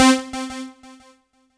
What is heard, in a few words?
C3, synth line; 90 s Sample CDs; NiceBeats; SynthLine VOL-3